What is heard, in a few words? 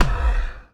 BallHit Hard Breathy; Spinal Serve